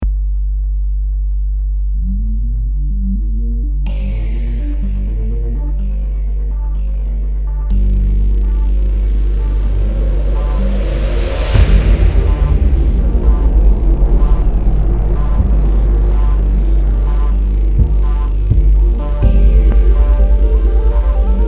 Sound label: electronic industrial short film